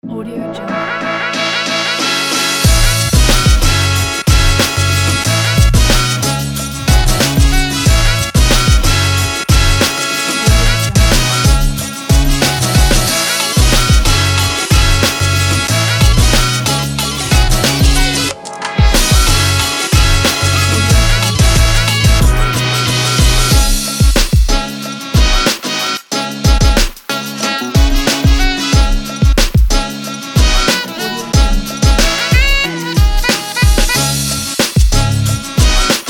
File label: this is hiphop; feetmusic